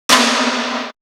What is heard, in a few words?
Tom, Drum One Shots; AMG - Coldcut's Kleptomania VOL-1